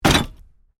Plastic container full of bottles and cans: short hit; Impact; Miscellaneous Impact Sound Effects; Stuart Duffield